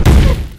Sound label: dog footstep run; Garry's Mod; hl2